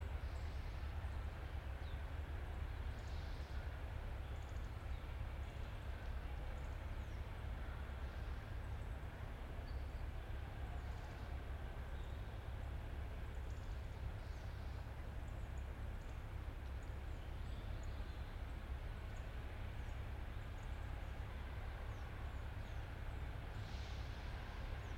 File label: yamanakako sound